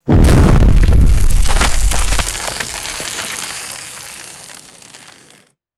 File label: loud explosion with falling debris